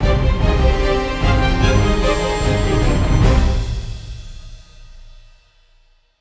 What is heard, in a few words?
battle end, Orchestral Background Music; Epic Adventure